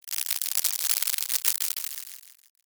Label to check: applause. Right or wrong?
Right